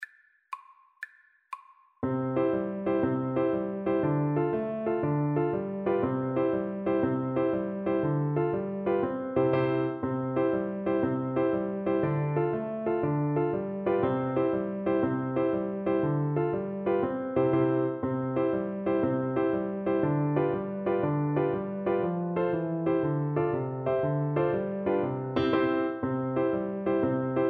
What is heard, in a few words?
irish jig medley, Viola